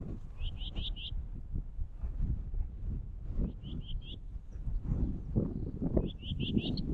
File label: Sylvia communis rubicola worrying